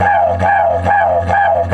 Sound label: F 140, DIDGE RIFFS; Big Fish Audio - Didgeridoo and Other Primitive Instruments